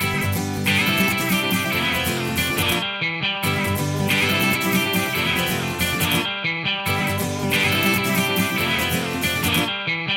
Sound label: Guitar Ringtone